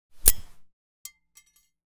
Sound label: Grenade Under Cook; zps; Weapons; Explosives